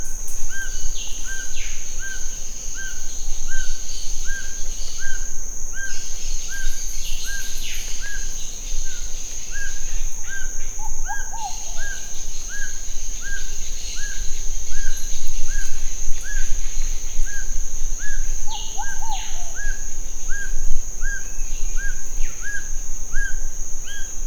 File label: Black Bushbird; پرنده بیشه مرغ سیاه